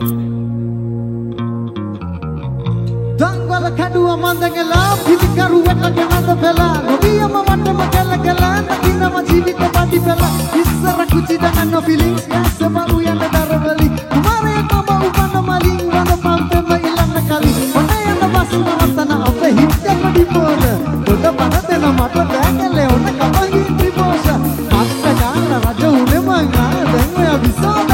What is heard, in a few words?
Rap; ShaaFM; Sindu Kamare